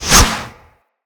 Whip Whoosh; Weapons; Corpus

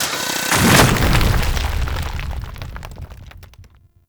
Ghoul Burrow Enter; Warframe; Grineer Ghouls; Ghoul Drill Sergeant

